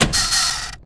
repairkit

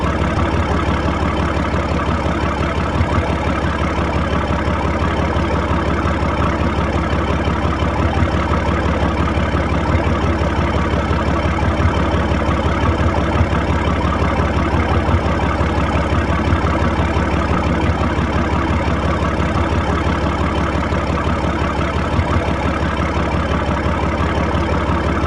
tank engine

